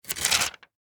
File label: Office Notebook Tear Single Sheet; Ripping (Sound Effects:Objects)